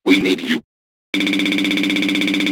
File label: Mic; lethal company